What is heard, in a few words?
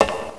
metal